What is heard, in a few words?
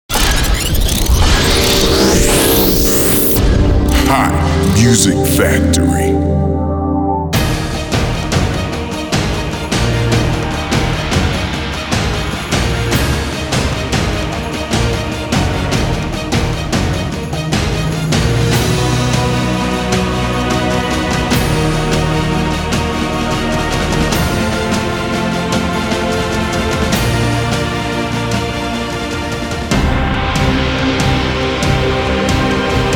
movie themes epic